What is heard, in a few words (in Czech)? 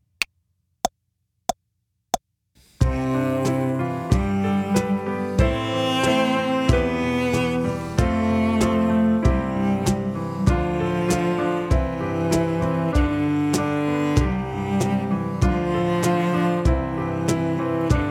instrumental vyletela holubicka ze skaly